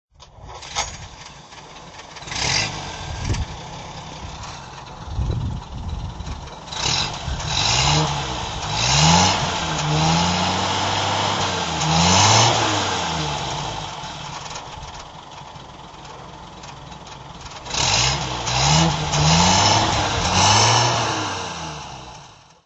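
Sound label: bentley 3.5 litre throttle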